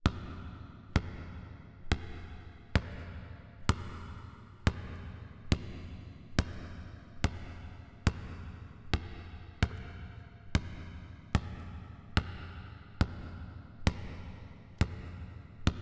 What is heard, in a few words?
Sports Basketball Dribbling In Gymnasium